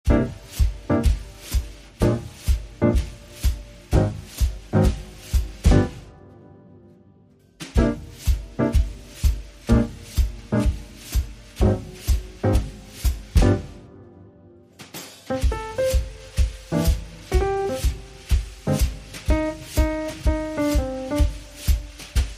Jazzy Jams, Brushes and Piano

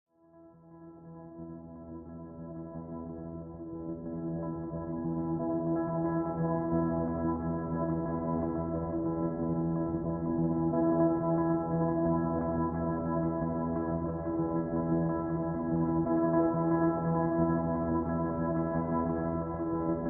Echoing Guitar

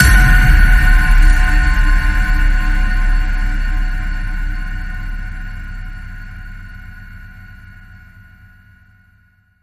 Lost In The Ping, F sharp

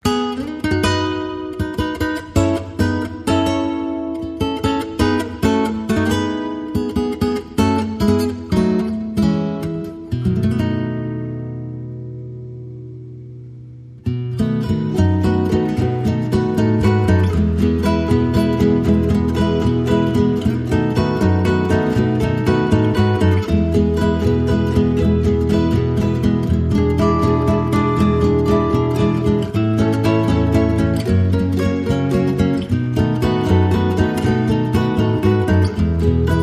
Colombian Waltz, No Melody

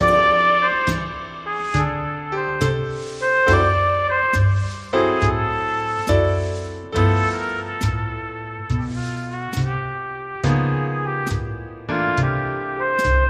Midnight Jazz

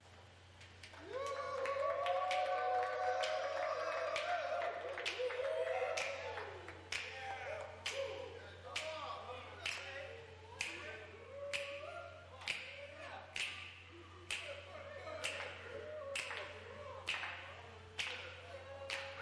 Jazz Club

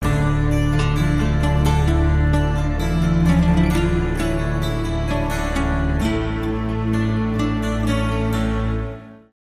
Gondole Flamenco

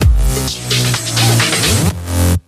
House Beat, Sting

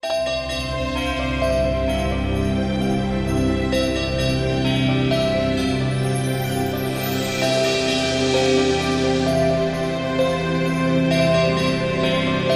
Ambient Sting

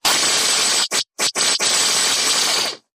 Static Breakup

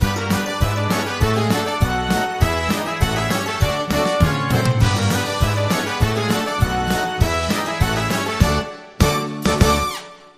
Octoberfest Sting

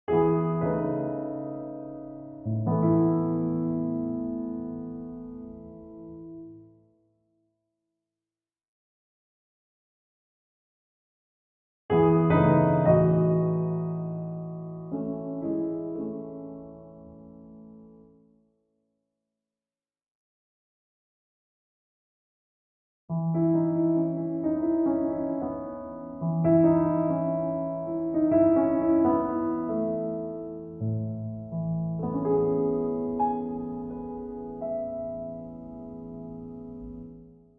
Underscore

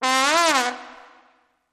Trombone Medium Gliss Up and Down

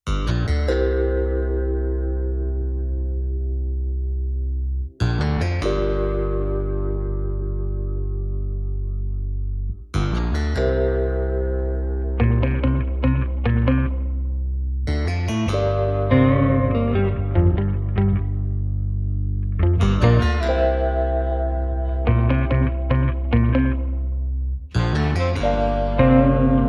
No Percussion

